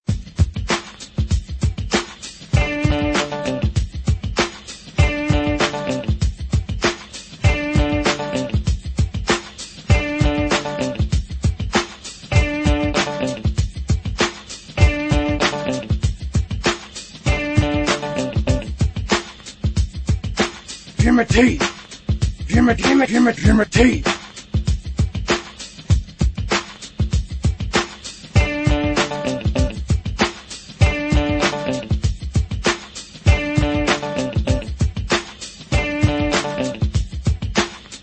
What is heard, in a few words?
corn beat